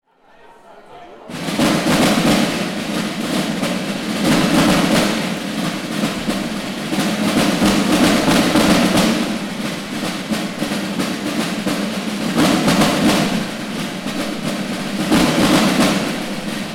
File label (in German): Pausen Gong